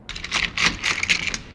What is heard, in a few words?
Footsteps Door Opens; Inquisitor 2